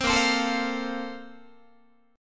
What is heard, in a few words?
Bb Major 7th Suspended 2nd, Guitar; BbM7sus2 strummed; JGuitar